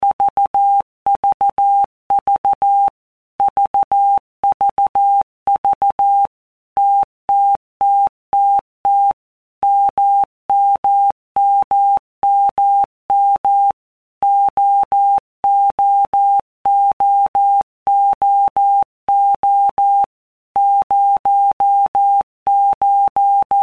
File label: TMO0, Morse Code; Basic Sets; Basic Letter Groups By Blocks